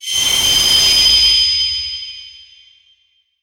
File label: sword transform